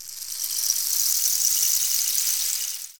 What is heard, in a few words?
rainstick; Ableton Live 12 Lite; Max